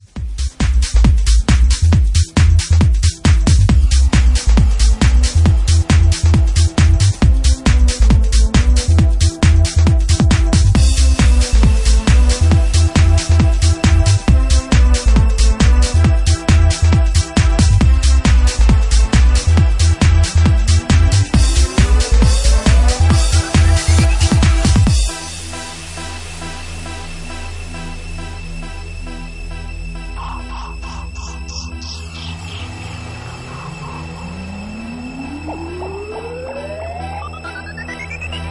Organ Mix; Ecko Records

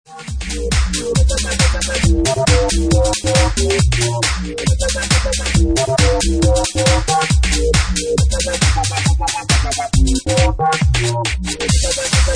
Bassline; Bassline Meltdown Session 1

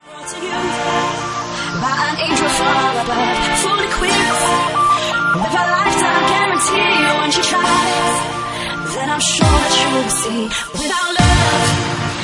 Retro Organ Mix

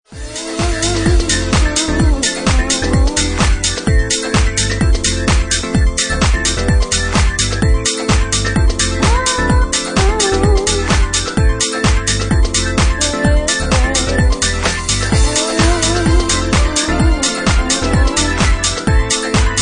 Swing Bass Mash Up

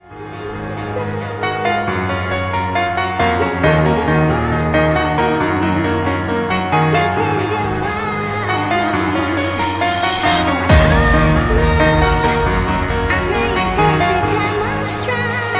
Organ Mix

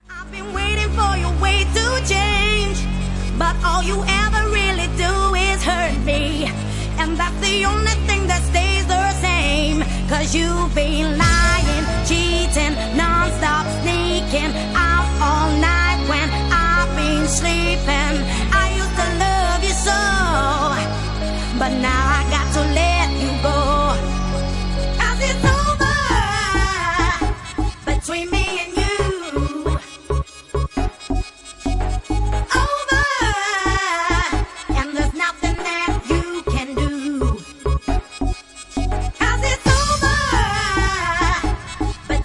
Bassline Remix

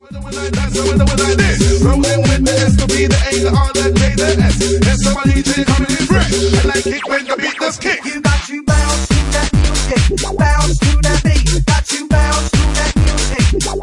Bass Package

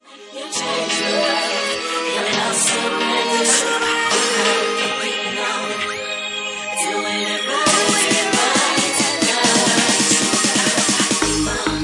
Organ Mix; Bassline House at 136 bpm

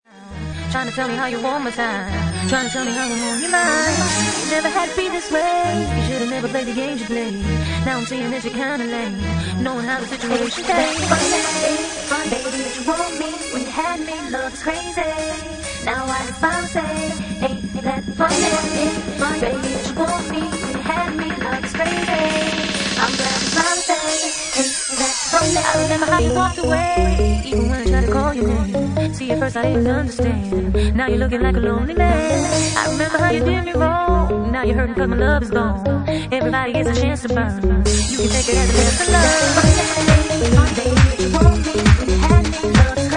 Organ Mix

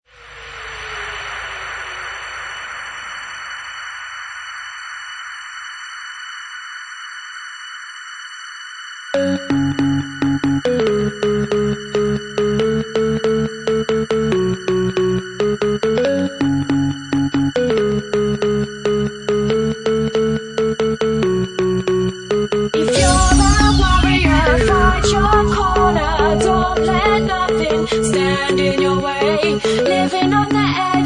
Bassline Organ House Remix

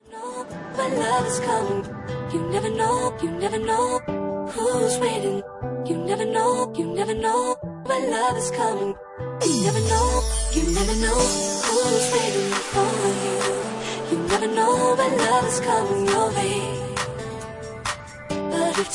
Bassline Remix

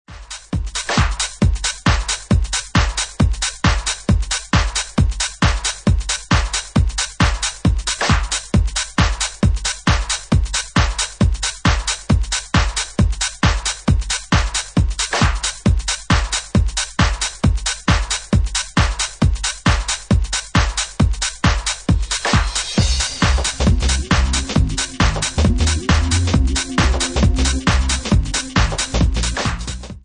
Double Drop

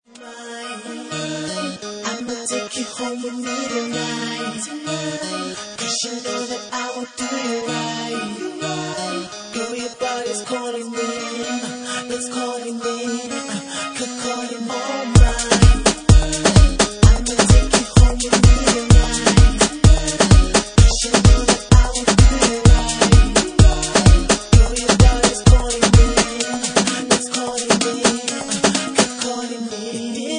Uk Funky Mix

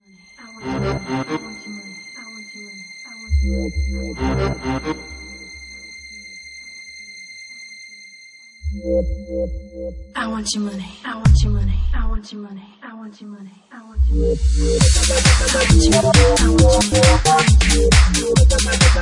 Bassline Remix

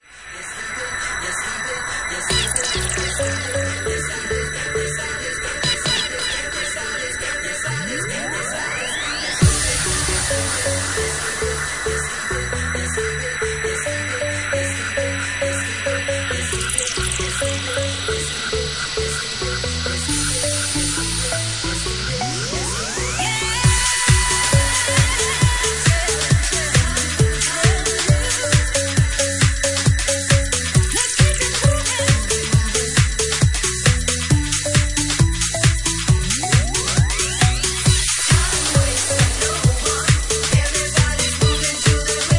Organ Mix

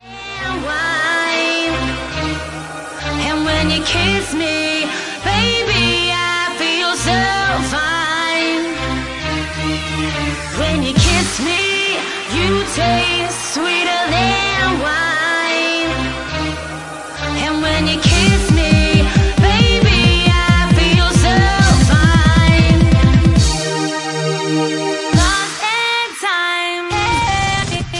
Bassline Mix

